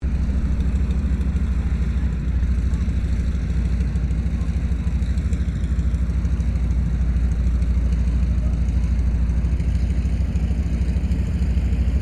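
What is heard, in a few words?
AIRCRAFT AT-6D HARVARD MK III TAXIING SHUTDOWN, Harvard Training Aircraft; Airplanes (Sound Effects:Vehicles)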